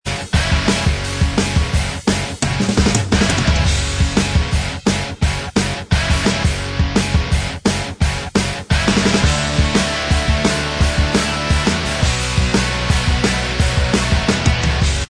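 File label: Instrumental Track, backing tracks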